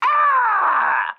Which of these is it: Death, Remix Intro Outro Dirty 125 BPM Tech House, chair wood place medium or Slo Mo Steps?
Death